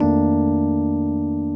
CHORD, Drum & Bass, 150 BPM; Ueberschall; Drum & Bass Flash Trackz

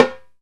Timbales; E-MU Producer Series Vol. 2 – More Studio Essentials; Composer; Timbales Xswitch

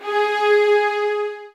SOFT VIOLINS

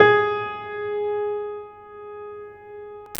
PED MF, GRAND PED

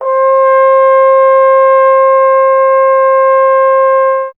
Trombone C; Miroslav Vitous - Solo Instruments VOL-1

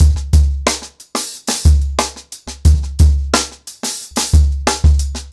90BPM, ACOUSTIC DRUMS

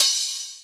HH, HIHAT HIP, Dance Mega Drums; Best Service

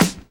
Dance Drums; Best Service ProSamples vol.15; AKAI

